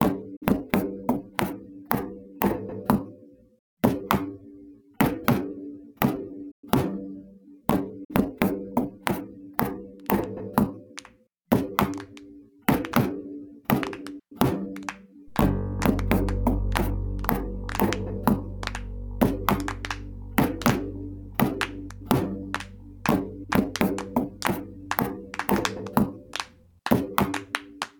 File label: clap bass; bored